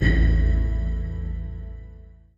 solve sound

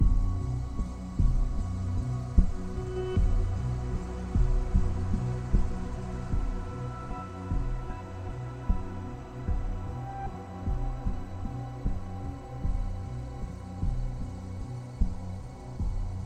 Ambience 76BPM; Anchor ActAll

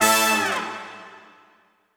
Fanfare; Anarchy Splatcast

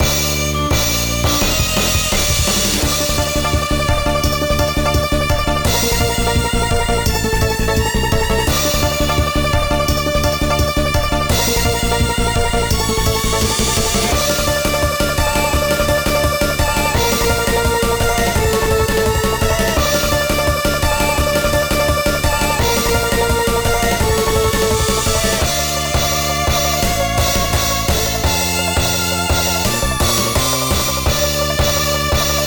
Dark Forest (Instrumental); Friday Night Funkin Mario's Madness

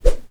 Target Mouth Throw; the princess and the frog; Diner

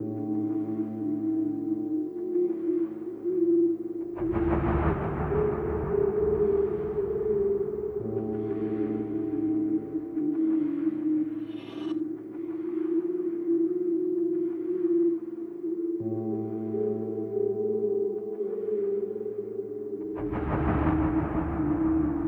Music Small Coil End; PS Vita, PS4

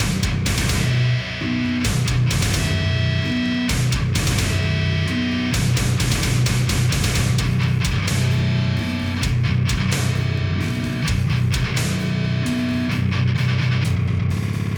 Lose

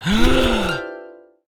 China Miss Sound; Jolly Battle; Android

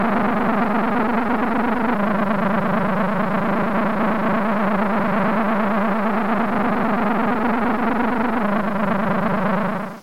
Noise Score ／ノイズの楽譜; Garage Bad Dream Adventure

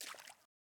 water step; teardown; ps5 windows xbox series xs

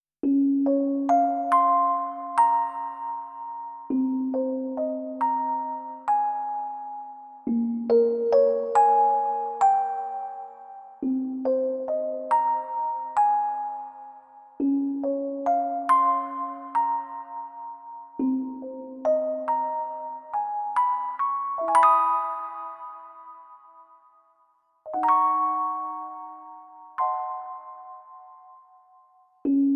Music Box; Otomedius G; Valhalla

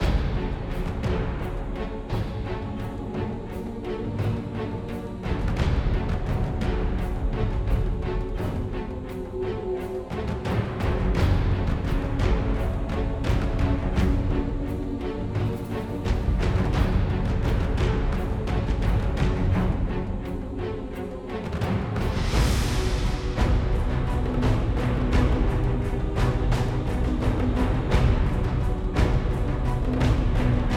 Battle Bloodguard Attacks; Eon Altar

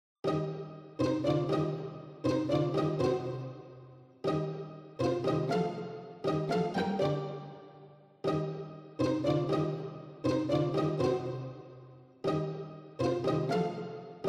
Pizz; tintin reporter cigars of the pharaoh

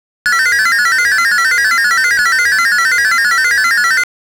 Slot Machine; super pac man; Lucky Target

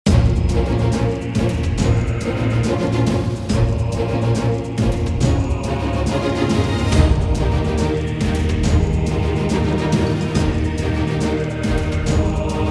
Menu Action; Forced Showdown

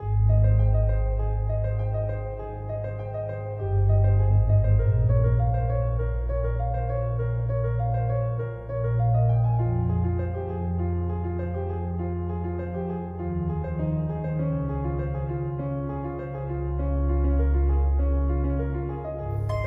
bgm story emotive; sword of the necromancer

